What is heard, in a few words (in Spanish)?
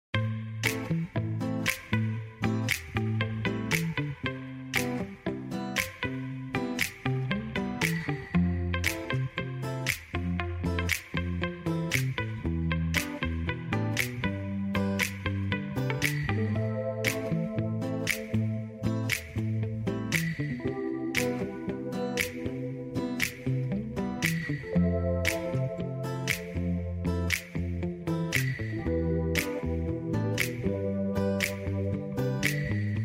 PISTA Saxo